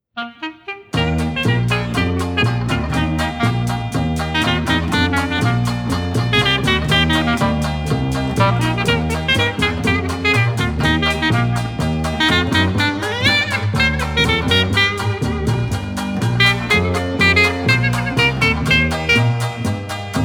диксиленд

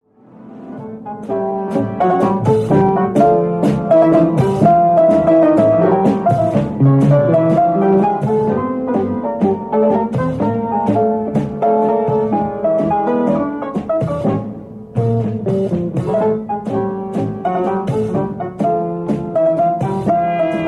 ansambl

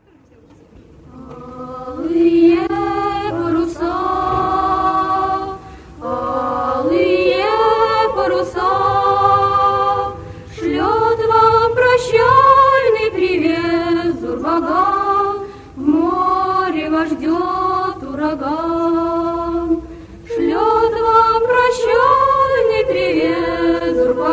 shkolnyiy vokalnyiy kvartet

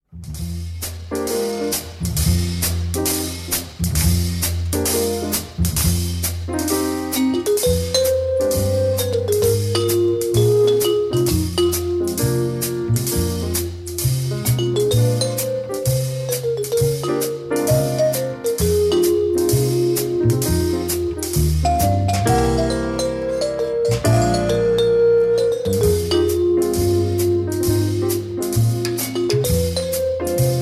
djaz kvartet

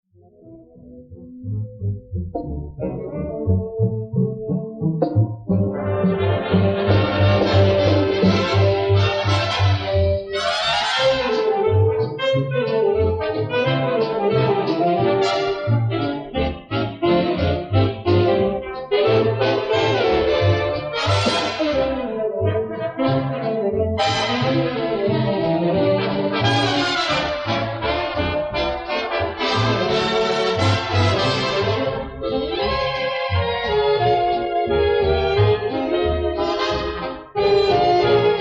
estradnyiy orkestr

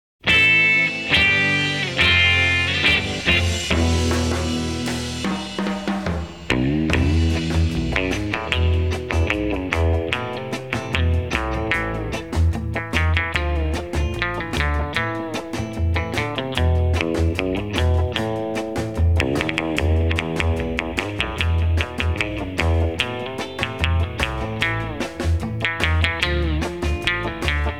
gitara jazz

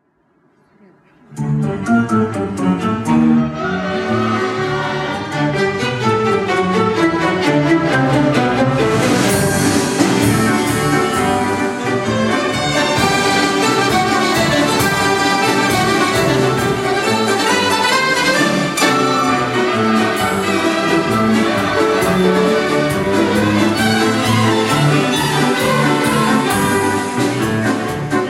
matchish

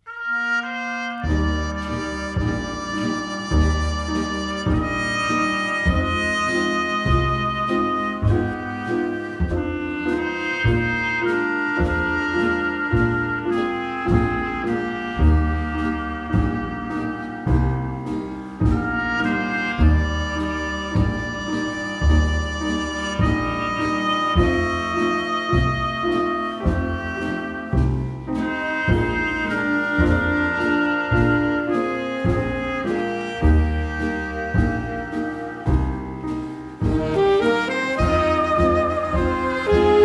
djaz orkestr

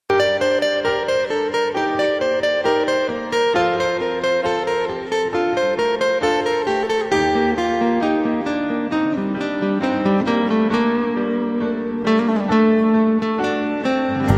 melodiya gitara

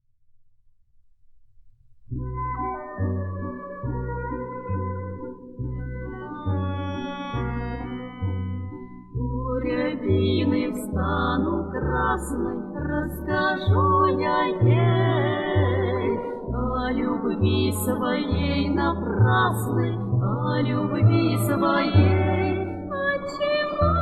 vokalnoe trio

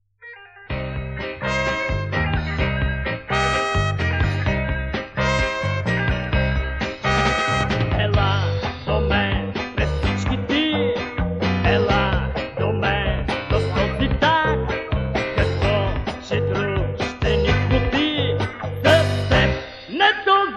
tango

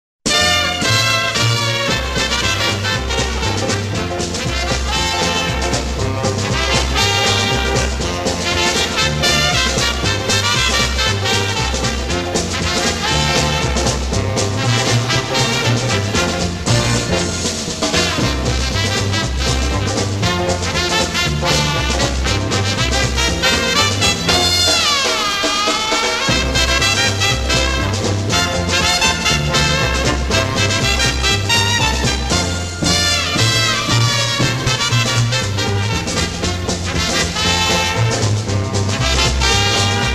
instrumentalnyiy ansambl

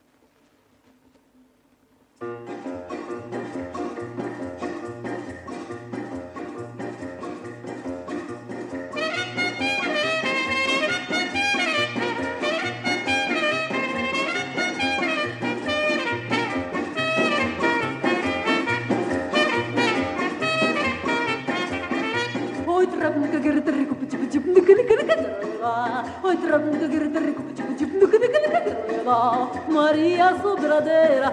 brazilskaya shutochnaya pesnya skorogovorka

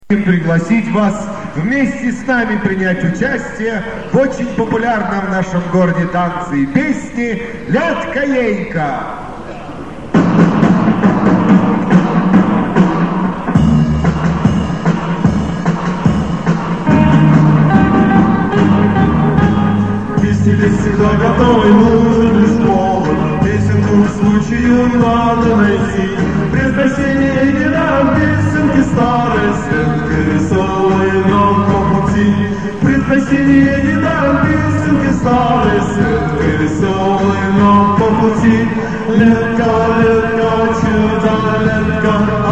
letka enka

